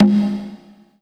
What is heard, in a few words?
Kawai K11 Low Conga